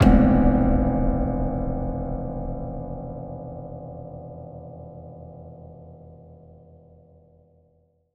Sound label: Impact